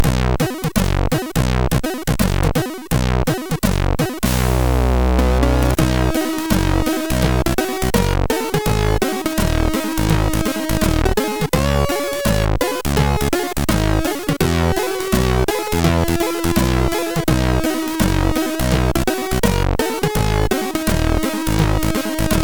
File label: Jazz, MOS6581R3